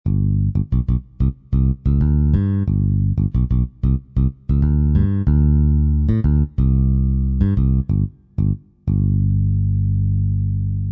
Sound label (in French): basse succes; MOBICLIC 83; CPLUSCLAIR